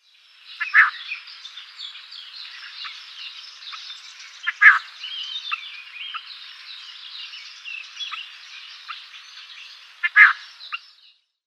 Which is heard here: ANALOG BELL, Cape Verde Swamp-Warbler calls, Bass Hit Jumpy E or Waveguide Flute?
Cape Verde Swamp-Warbler calls